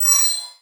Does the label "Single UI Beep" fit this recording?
Yes